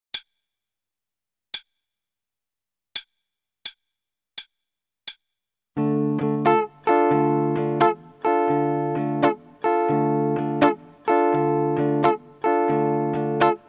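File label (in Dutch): Ritme, gitaarstarter; Meespeel CD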